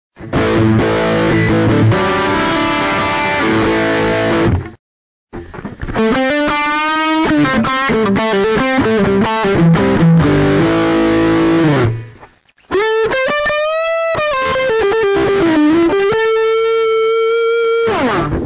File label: Music Metal